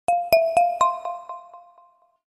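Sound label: blackberry attentive notification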